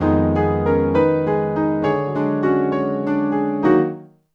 Piano, Acoustic Pianos

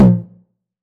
909 Tom Sweet; Goldbaby Tape808 & Tape909; The Tape 909

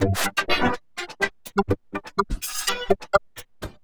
Granular, LOOP 125 BPM; Bolder Sounds - Granular

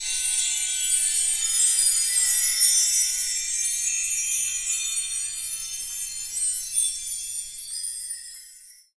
BELL TREE; 3D Sound; 3D Keys VOL-1

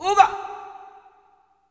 male cry, Africa; Spectrasonics; Heart of Africa 2; S.MALEAMB

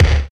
BD, BD Dance

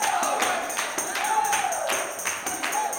160 TAMB BRK, blues soul; Spectrasonics; Vocal Planet

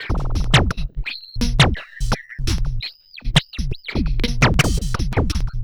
Drums Strange

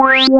SAW FILT

